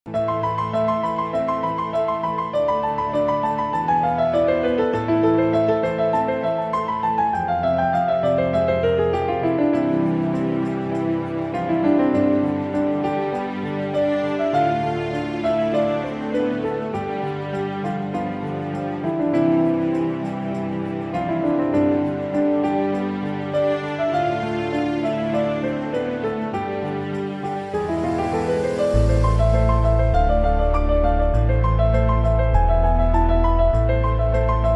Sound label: theme music piano cover; kadhalar dhinam; antony musical